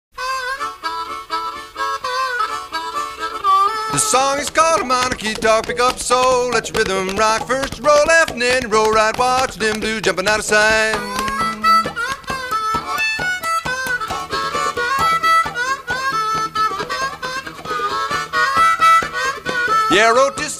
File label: harmonica talk; kouqin